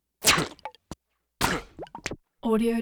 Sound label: comic weapon blast liquid burst squirt drip